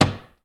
BUTTON Medium Click; mono; Universal Sound FX

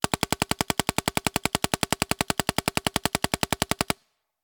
Paintball Gun; Sound Ideas; Guns

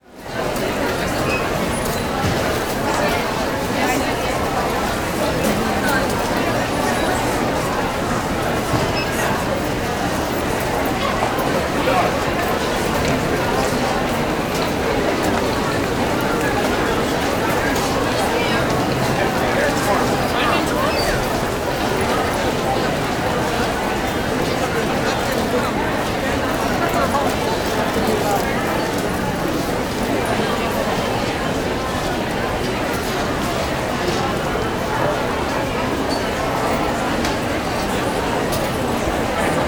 Station Busy Bus, Walla; Sound Ideas; Walla Series

